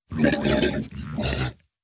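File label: orc suffocates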